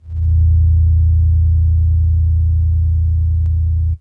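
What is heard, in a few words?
DX100 F#4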